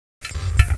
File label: cannon reload; wanted; weapons